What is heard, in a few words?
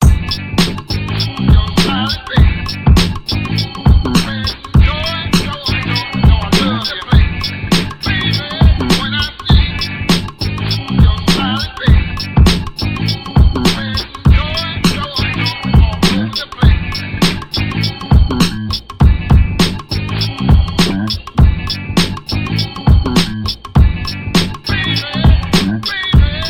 beat